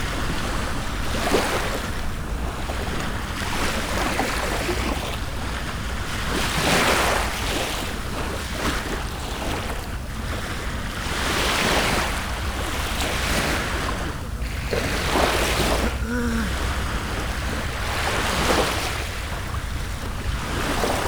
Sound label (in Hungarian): locsogos